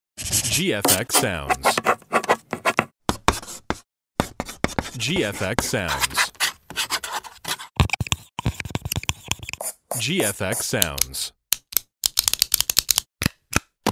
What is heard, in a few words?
handwriting; handwriting sfx pack